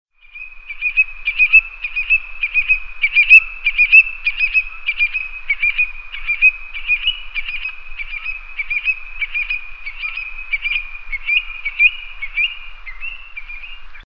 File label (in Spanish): alcaravan comun